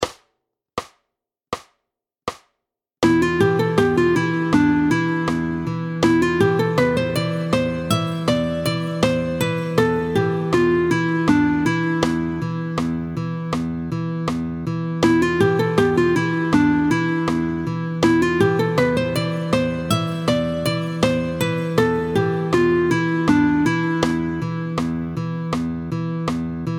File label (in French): Phrygien, Le mode de mi phrygien, tempo 80